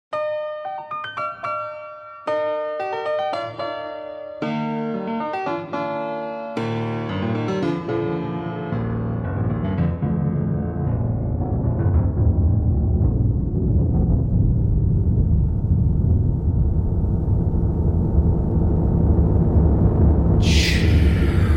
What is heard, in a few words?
cheers